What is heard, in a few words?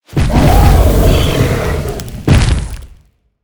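boss death, monster; galiardo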